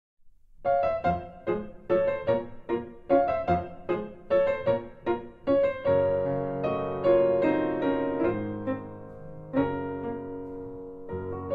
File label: Piano